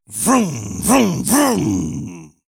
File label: vroom